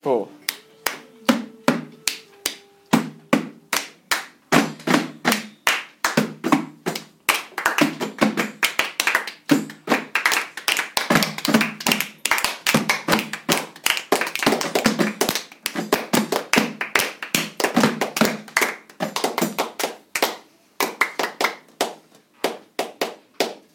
clapping piece

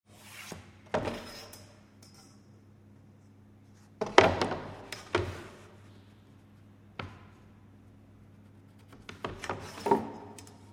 marking up wood

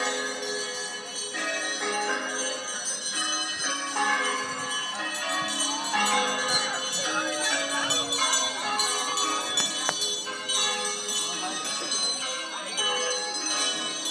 olympic bells, village; alderton

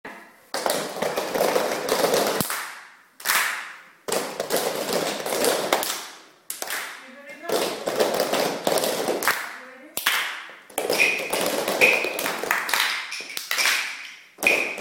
percussion

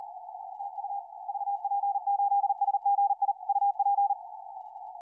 south georgia on 15m cw; vp8sgi

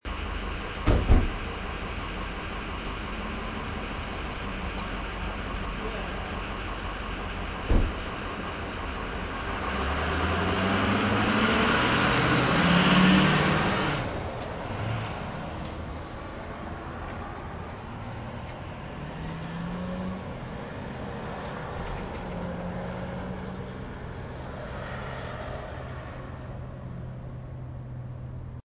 diesel pickup at bus stop

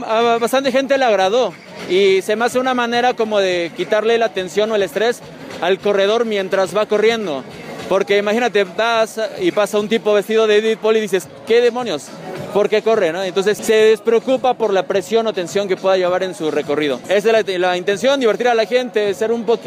running music; pool runner corredor 6 carrera nocturna tribuna

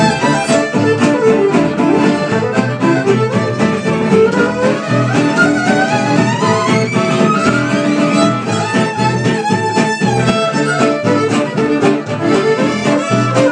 gypsy jazz finale

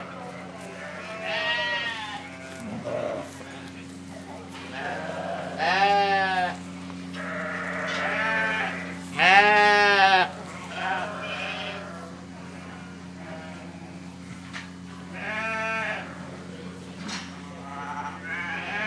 sheep bleating; lincs show

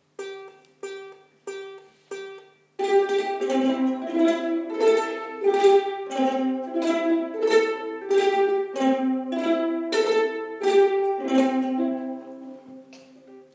we play

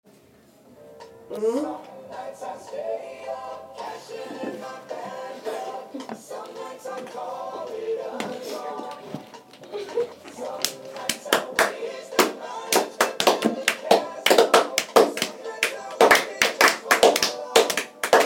clapping piece with backing

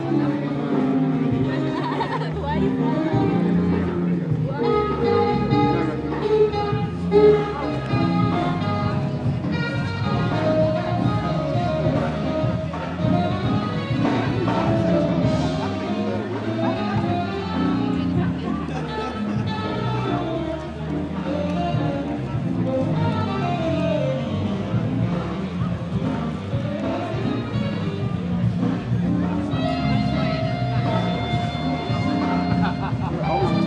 live jazz

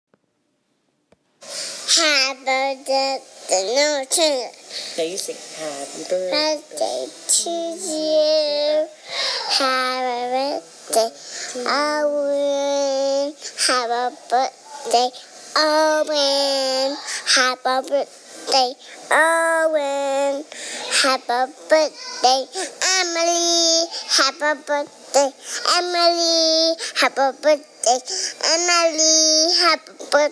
happy birthday song

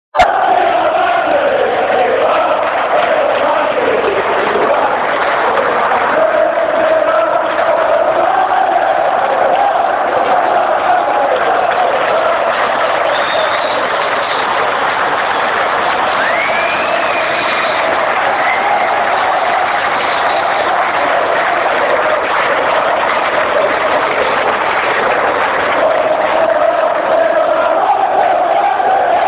chant; milan mandaric

